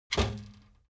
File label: object office trash basket metal hit rattle; smartsoundfx